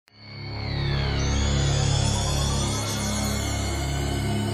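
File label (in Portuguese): abertura, gospel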